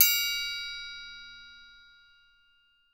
TRIANGL; Voice Crystal - Steve Reid Definitive Percussion; BELLS+CHIMES

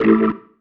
Stab, Organ, One Shots

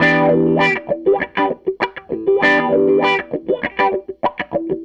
SG Guitar Loop

